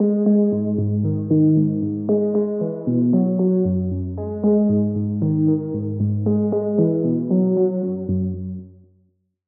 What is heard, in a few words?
Key - Hazard Memory; Routed Sound; Vital Expansion - Street Vanquisher Vol.1